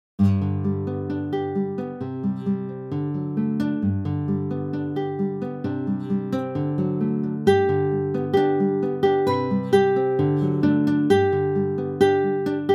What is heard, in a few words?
rbi acoustic